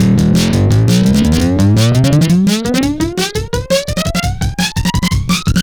170bpm, Drum and Bass Breaks, FX Drums; EZ Rollers

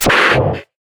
Percussive Hit, contact mic

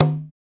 RM CGA LO, congas; Drums In Your FACE!

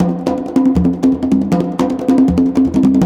CONGA BEAT; Zero G - Ethnic; CONGA BEATS

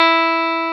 DRY GUITAR; E-MU Formula 4000 Series Vol. 3; Analog Odyssey

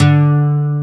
ACOGTR

